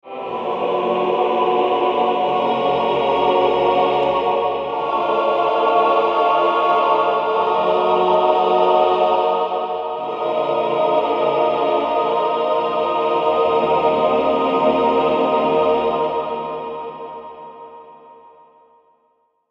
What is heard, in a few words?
choir; wusik